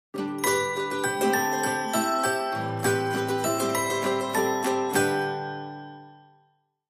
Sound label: Sting